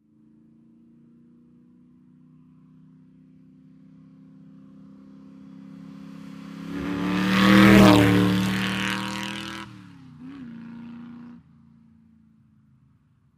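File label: car passing by fast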